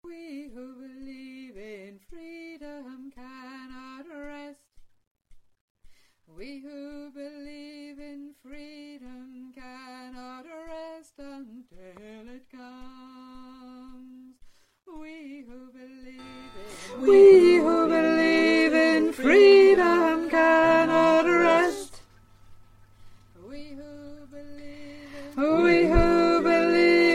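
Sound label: Soprano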